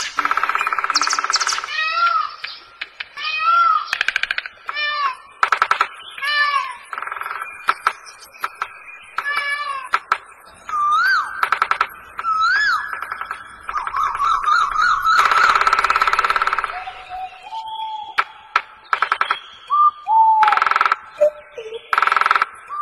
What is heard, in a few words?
Different Birds Sounds